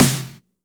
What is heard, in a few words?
Snares; Vengeance - Essential Clubsounds Vol.4